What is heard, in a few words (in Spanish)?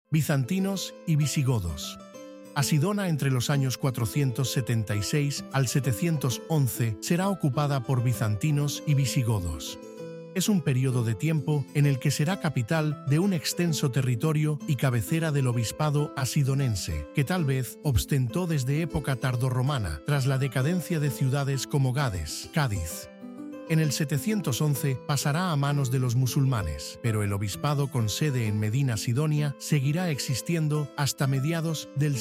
audioguia música